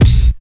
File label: silky kick a sharp key; kick drum samples